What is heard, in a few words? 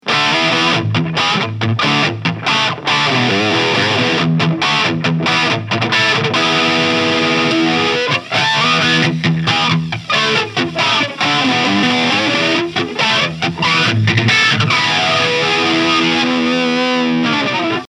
Disturbance Flanger; Death By Audio